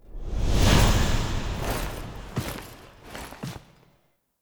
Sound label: Camera Spot To Operator, Cinematics; Warframe; Lotus Eaters